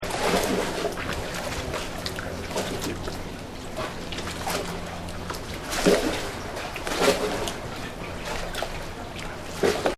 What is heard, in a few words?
Tread Water; Water Sounds; Nature; Partners In Rhyme